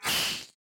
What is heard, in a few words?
snake attack